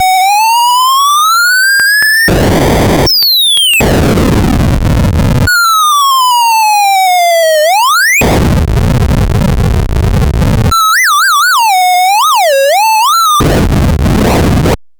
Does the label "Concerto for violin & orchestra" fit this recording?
No